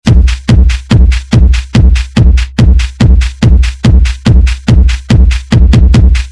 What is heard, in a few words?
Loop